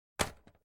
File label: wood small